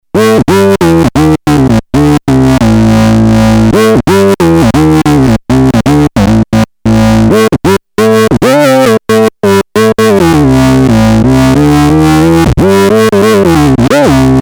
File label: Monophonic solo, Novation Bass Station II; analog monophonic machine with subtractive synthesis